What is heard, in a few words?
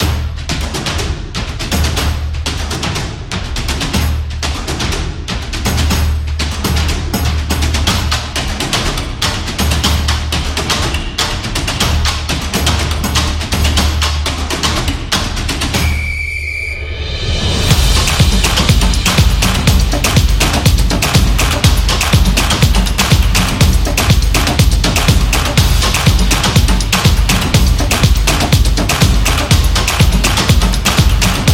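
Sport Energetic, Football percussion background music inspired by samba rhythm, stadium energy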